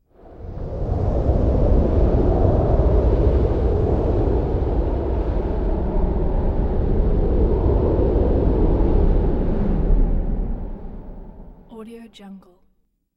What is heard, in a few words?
wind; bbrealsound